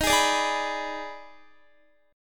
Eb Major 7th Suspended 4th Sharp 5th Eb, Guitar; EbM7sus4#5; strummed

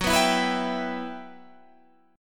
E Minor F sharp, chord; Em/F#; strummed

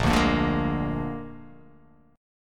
A sharp 7th A sharp

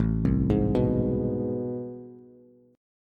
A Minor Major 9th, Bass; arpeggiated; JGuitar

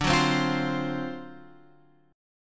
D 7th Sharp 9th D, Guitar Open F; D7#9 strummed; x,9,6,7,8,7

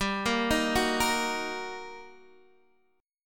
G Minor Major 7th Sharp 5th, down, Guitar, DADGAD

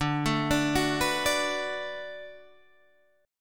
D 6th D down Guitar Open D, chord

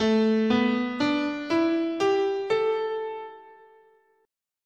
A Suspended Pentatonic

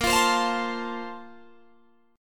A sharp Major 7th Suspended 2nd, Guitar, Open G

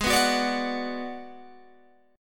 Ab Minor 7th Flat 5th Ab, down, Guitar, Open G

